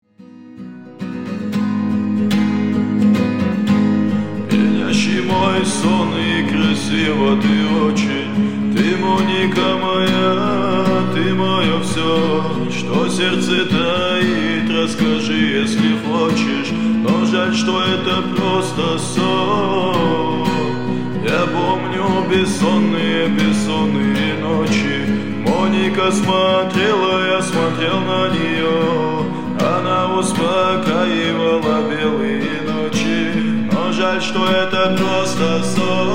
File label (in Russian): gitara